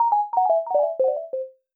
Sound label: Jingle Comic End